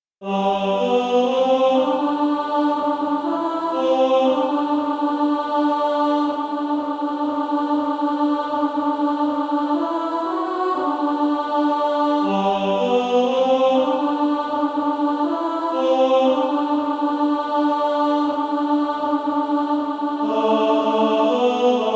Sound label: Female Choir